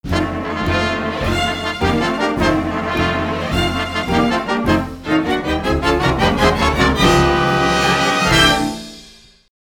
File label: swing out fanfare